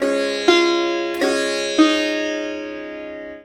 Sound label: SITAR LINE, SITAR MELODY; Best Service; World Colours